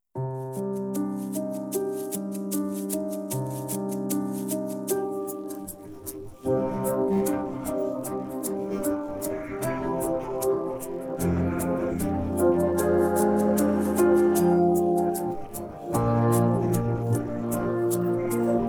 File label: Guitar Version